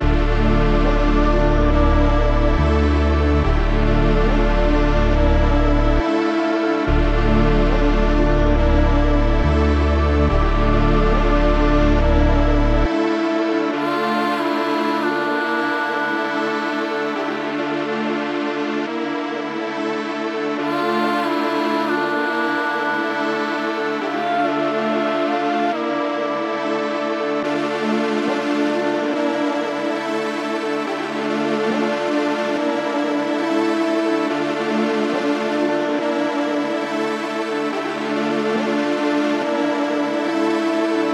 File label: 140 BPM D Min, Drill; Empty The Clip